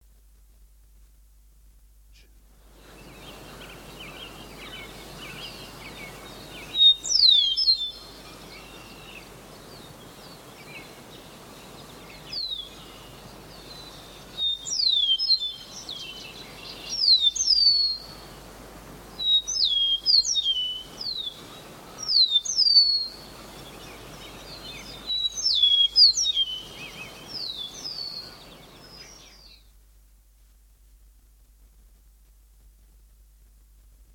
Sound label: eastern meadowlark, songbirds